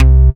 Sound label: Bass C, Offbeat Bass